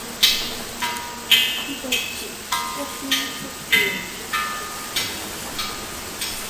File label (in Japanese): suikin